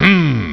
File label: humm; BattleSNDS